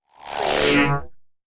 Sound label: drone slide beam